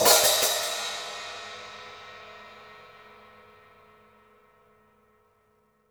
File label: hihat - opened; JazzFunkKit